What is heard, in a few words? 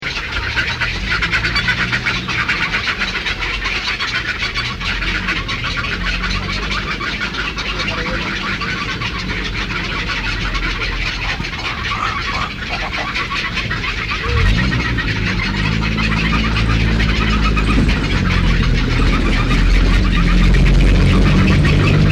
Egrets, herons and storks; Kenya